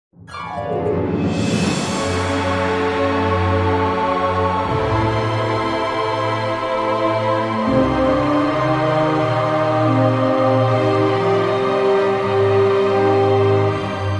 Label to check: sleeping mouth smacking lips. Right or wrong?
Wrong